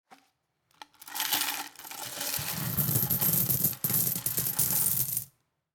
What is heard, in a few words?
lucky gift win